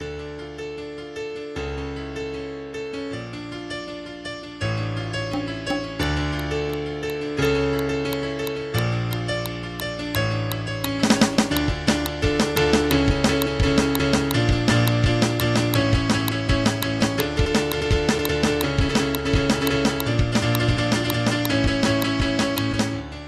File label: Improv Halloween